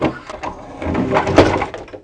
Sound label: wood op; Mafie 1